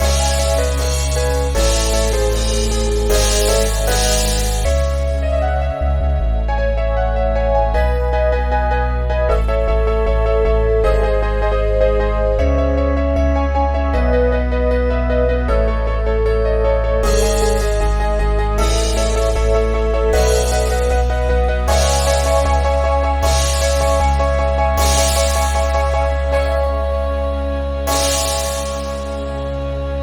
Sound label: Theme (Jazz Upbeat Version); Pony Music Archive 21.03